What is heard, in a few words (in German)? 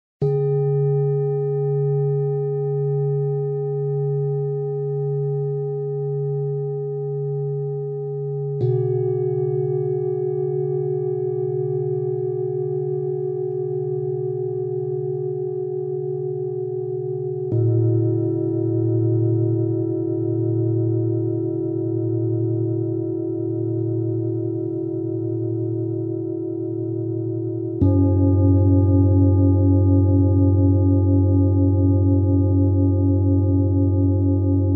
Klangschalen Meditationen